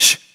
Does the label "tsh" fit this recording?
Yes